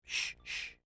shh shh, voices

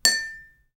cling

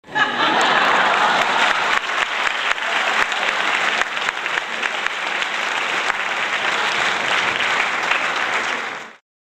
applause

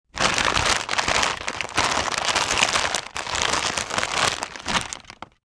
crumbling

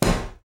bang, mechanical sound effects

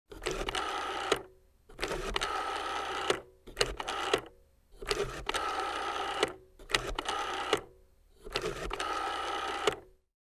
rotary phone

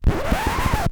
record scratch

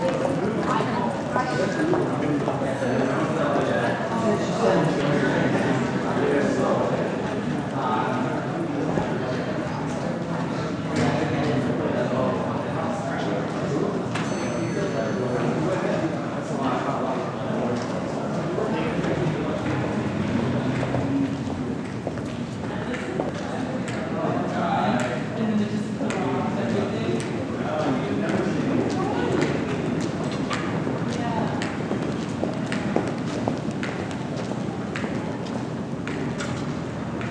lobby

